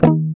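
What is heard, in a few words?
GUI D#, acoustic guitar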